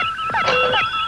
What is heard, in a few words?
comp snd